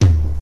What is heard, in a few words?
sharp reggae tom drum one shot f sharp key